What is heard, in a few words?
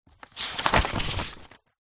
office paper construction sugar page turn book; zapsplat